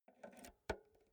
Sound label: insert jack multi socket slow